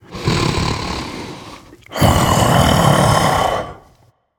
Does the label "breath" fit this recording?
Yes